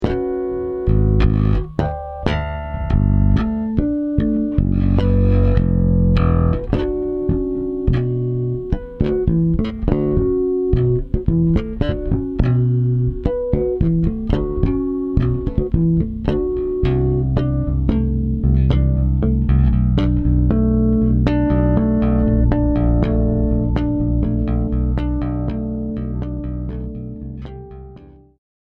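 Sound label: Harmonics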